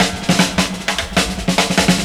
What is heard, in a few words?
DRMROLL; E-Lab; X Static Goldmine 2; akai